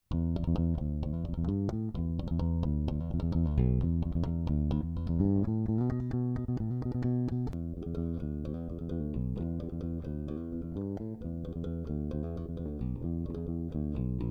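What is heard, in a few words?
Warwick Streamer Fingerstyle Neck Bridge Both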